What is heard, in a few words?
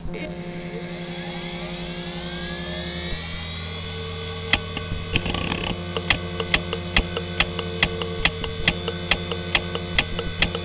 little click